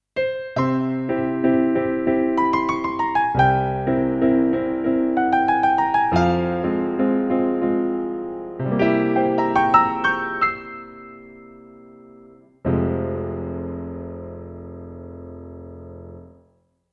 Thomann AK X1100 German Grand Piano

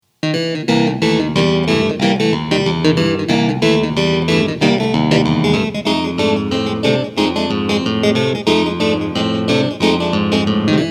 Hohner Clavinet D6 Soft Medium Brilliant

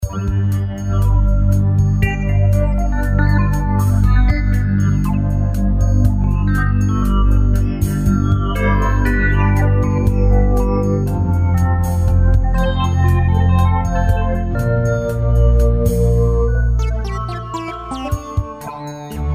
Rhythm

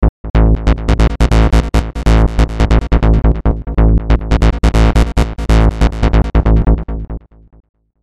bass

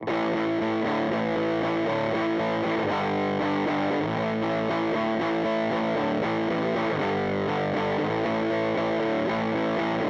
Overdrive Stacking Overdrive x 2 Circuit A B

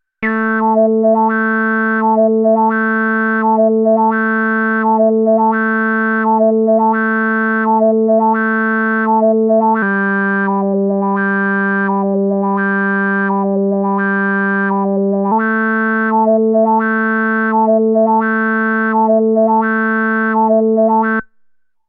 Genus Arpeggio; Rossum Electro Music Evolution

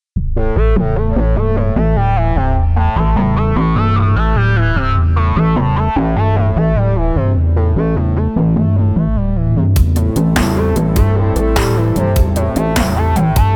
MS 20 V double Jun 6 V; Arturia V Collection 9

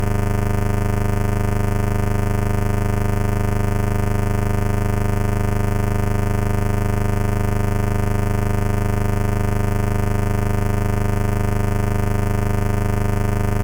Dubreq Stylophone S2 Square SubSubosc

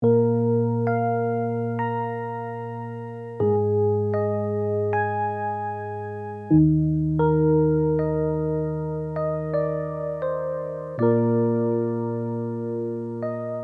E Piano; Dimension D Preset 2

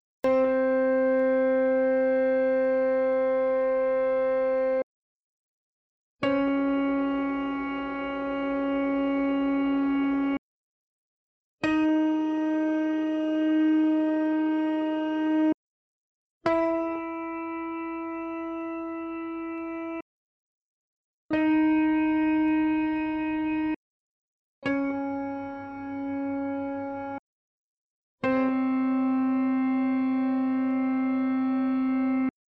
DNA Piano chord; 1st chord

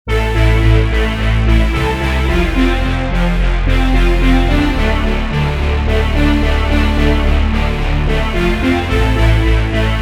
Sequential Prophet X; A New Wave

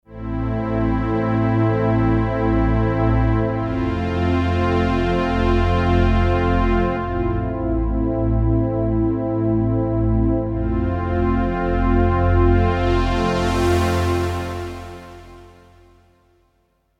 Jupiter Pad

